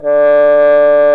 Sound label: trombone mute; Roland L-CDX-03 Disk 2; BRS TromboneMute